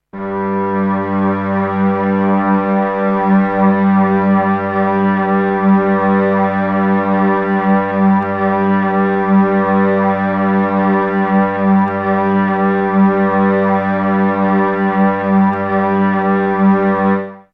String Section little hickups loop

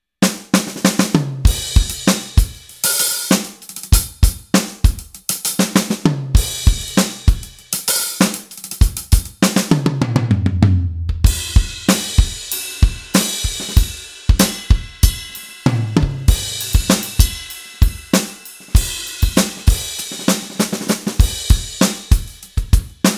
gewa G5 Modul; Pure Heart

